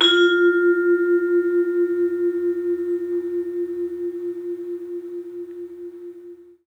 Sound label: bell; 3D Sound; 3D Keys VOL-1